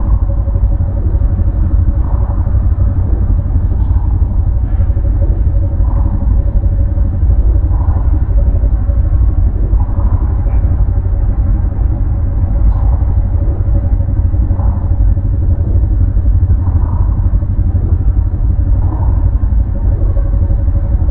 reptilian colossus idle; apocalypse

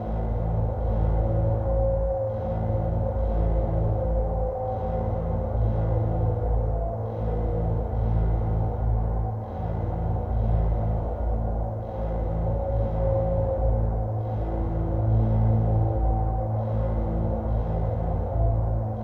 interstellar assembly pulse; Stellaris; megacorp

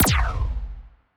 anti fire rate laser muzzle, weapons; biogenesis

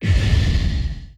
distance tachyon lance fire, weapons; Stellaris

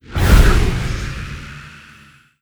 sfx corvette moving out; Stellaris; nemesis

